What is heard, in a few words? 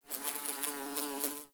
Bee; Warframe; Ambience; Nineteen Ninety Nine